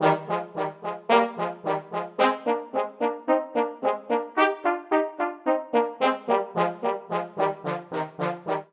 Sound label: Ostinato Dueling Dragonflies Eb Major 110 bpm, Brass Quartet; MIDI Clips; Ableton; Spitfire Audio